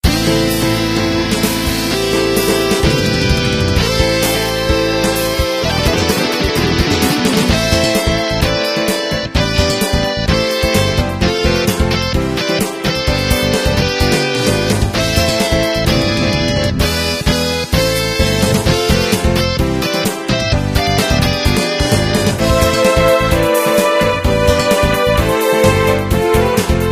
bgm; MapleShrine

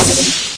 liftoff; MapleShrine; mkxp; gameasync

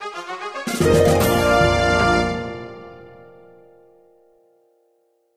Fanfare; MapleShrine; mkxp; gameasync

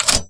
Door; MapleShrine; mkxp; gameasync

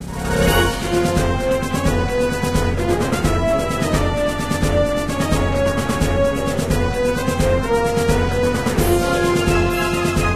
Last Boss; MapleShrine; mkxp; gameasync

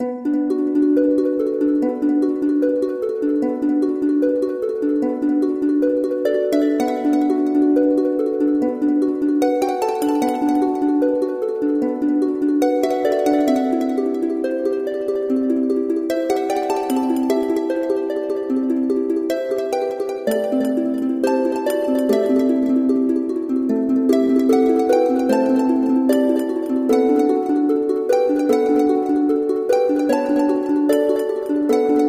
slow; MapleShrine

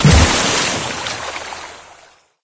Dive; MapleShrine; mkxp; gameasync

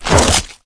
Attack; MapleShrine; mkxp; gameasync

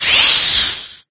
Monster; MapleShrine; mkxp; gameasync

